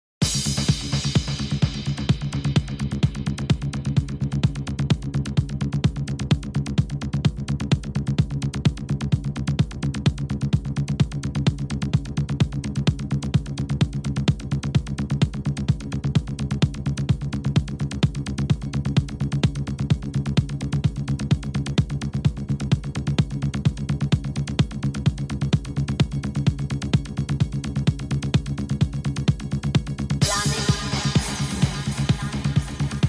techno live sets, dark techno mix; utrecht netherlands

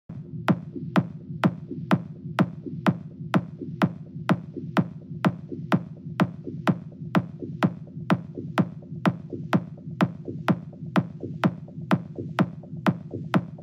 techno live sets techno; drumscode

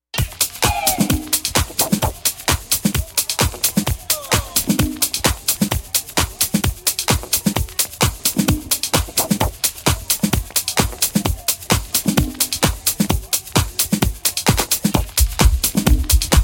all japanese techno set; tokyo x

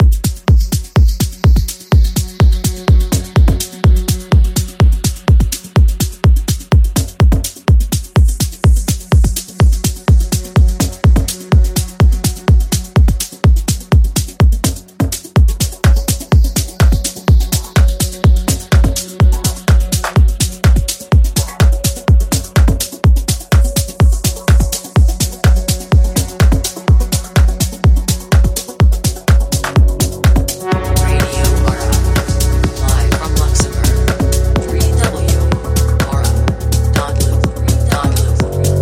melodic techno dj mix

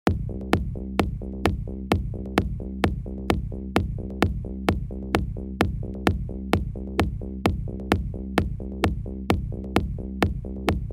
techno live sets, techno set; this is techno set

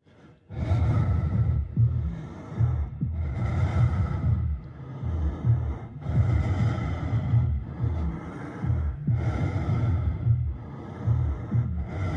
techno live sets

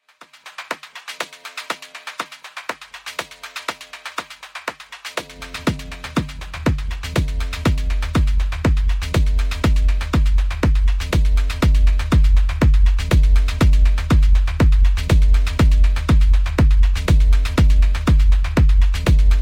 techno live sets

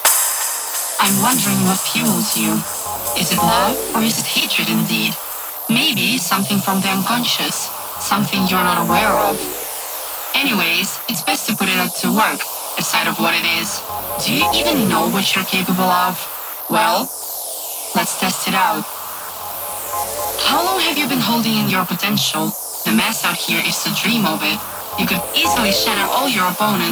bass; nbbrecords interface

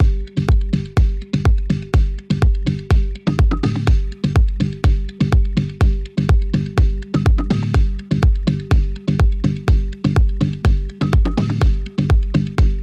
melodic house techno podcast; xbeat radio

